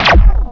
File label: Laser C Fire